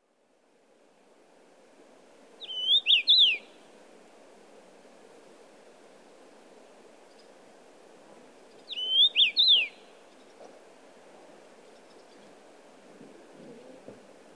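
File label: Common Rosefinch; akamashiko; baikal; koe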